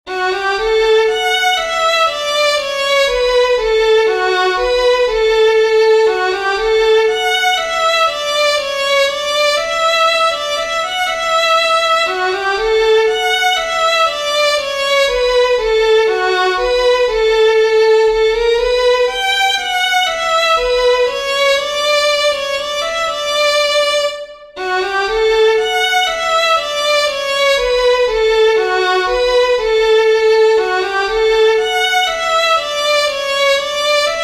WALTZ, fiddlers; southern kings fiddlers; PEEK A BOO

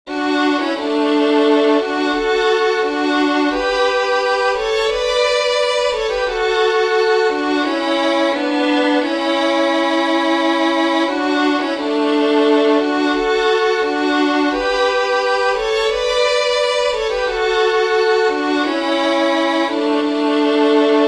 with harmony, fiddlers; LOVE OF THE ISLES; southern kings fiddlers

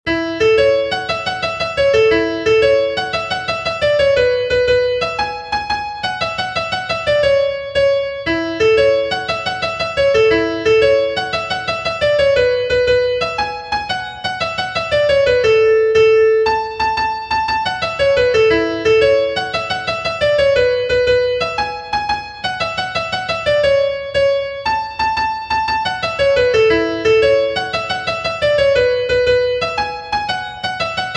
JIG, fiddlers; THE CHAMBERMAID JIG

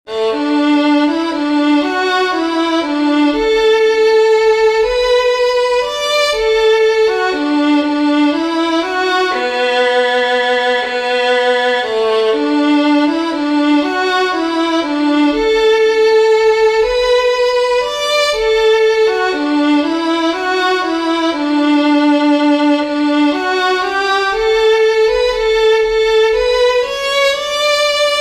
OLD ROSIN THE BOW WALTZ, fiddlers; southern kings fiddlers

